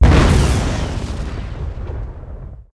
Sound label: grenade explode; weapons